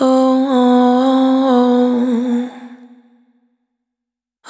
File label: Single Voice Melodic Loops